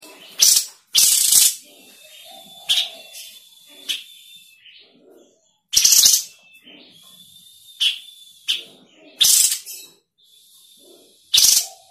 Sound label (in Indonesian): suara masteran sniper